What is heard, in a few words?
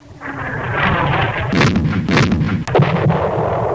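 air combat; MAMMOTH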